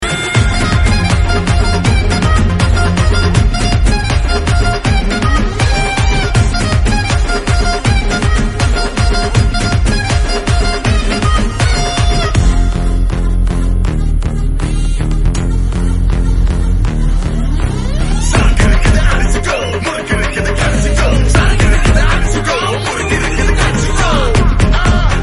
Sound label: Drum Beats Ringtone